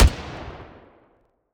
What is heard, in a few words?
hand Cannon; WEAPONS; Captain